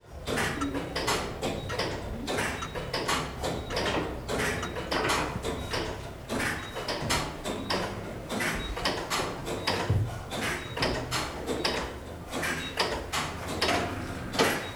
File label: Rhythm Clocks